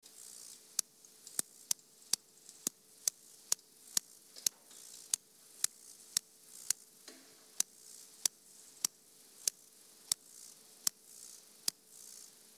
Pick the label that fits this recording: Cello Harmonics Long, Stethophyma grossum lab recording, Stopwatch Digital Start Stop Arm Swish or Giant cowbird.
Stethophyma grossum lab recording